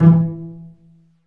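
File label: STRINGS, Cbs Pizz; Roland L-CD702; Cbs FX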